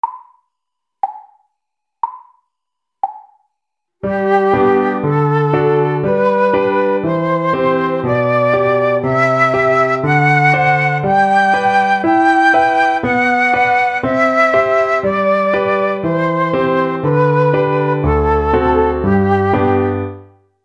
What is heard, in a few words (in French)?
Sol Majeur